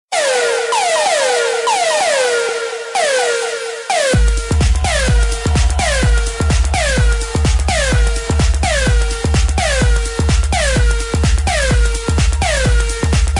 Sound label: dance floor